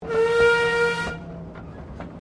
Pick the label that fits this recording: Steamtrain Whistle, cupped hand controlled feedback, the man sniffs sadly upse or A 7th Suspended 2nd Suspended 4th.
Steamtrain Whistle